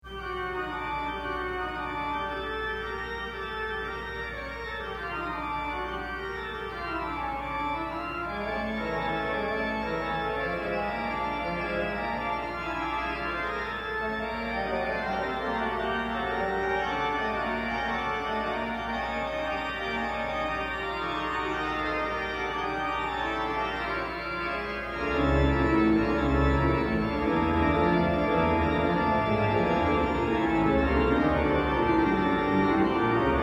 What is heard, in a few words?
Organ Master Works fugue; dupre